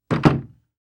wood sign hanging on door turn around closed

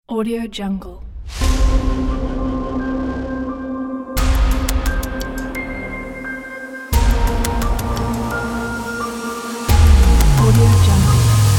movie trailer

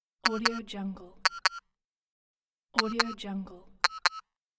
sensor search sound

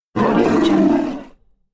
tiger roar; kuksaudio

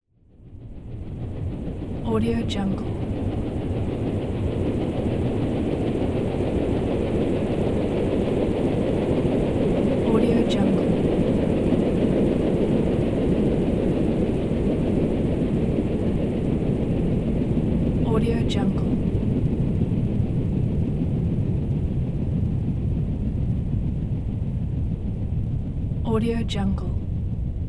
noise rise sound

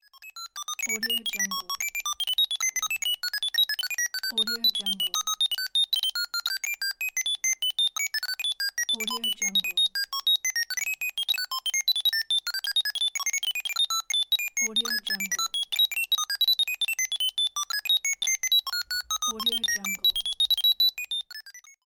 robot communicating

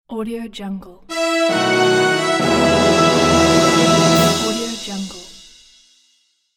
awards logo

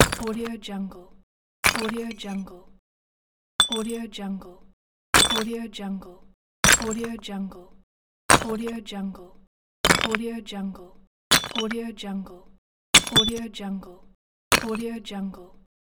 stone debris falls

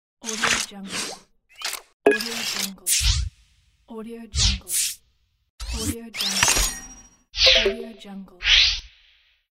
interface slide whoosh sfx; jbobstudio

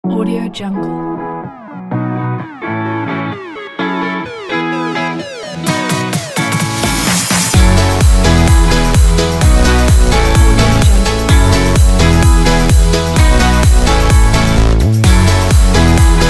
fun energetic happy pop

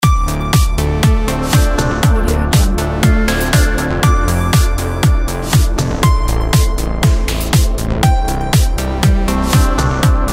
retrowave loop

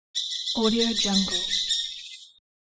bird chirping

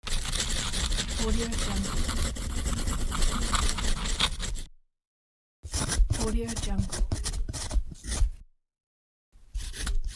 pen writing

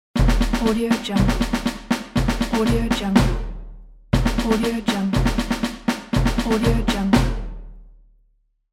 military drum roll

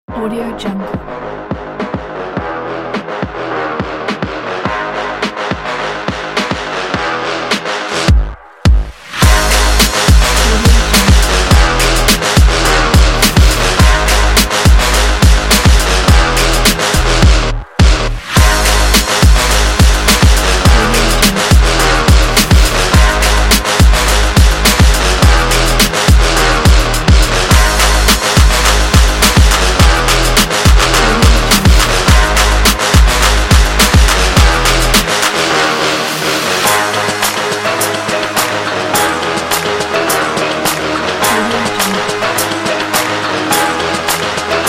powerful trap

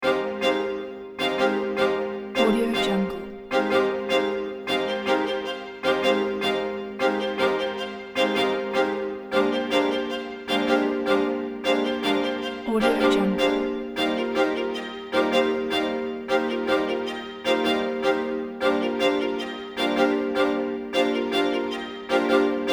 contemporary string orchestra

